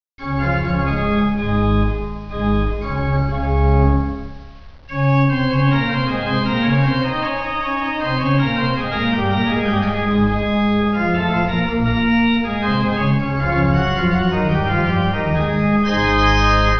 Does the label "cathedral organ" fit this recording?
Yes